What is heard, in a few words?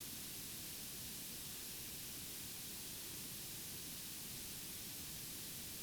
fire combustion